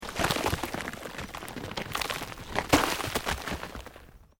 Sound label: EARTH LOOSE MOVE LONG, Debris